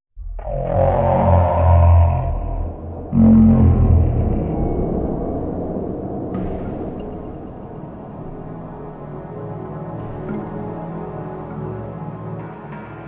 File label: game over; vapesquared